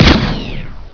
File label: RIFLE CUT; CHASM